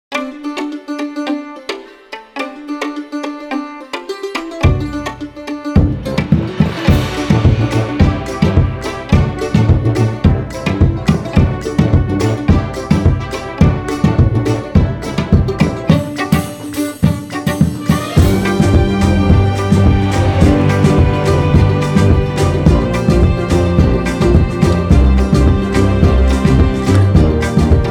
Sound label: Driving Slavic Folk Hybrid; In Love With Croatia